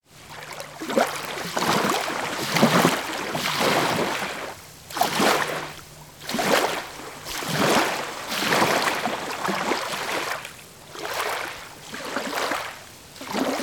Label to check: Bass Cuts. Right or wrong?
Wrong